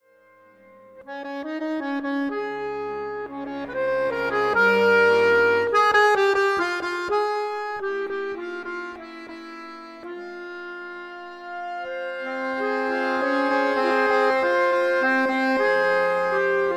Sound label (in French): accordeon